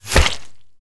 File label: bullet impact body flesh; Infernal Radiation